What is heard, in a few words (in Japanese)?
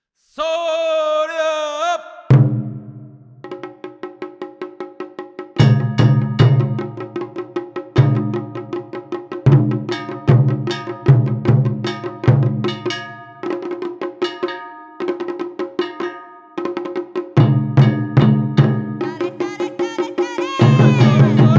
taiko; appare